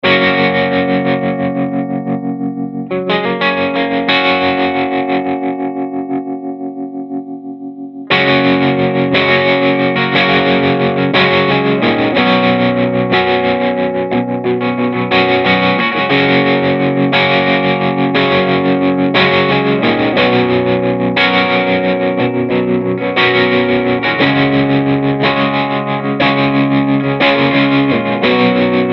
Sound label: trem single and loving it, tremolo; diamond pedals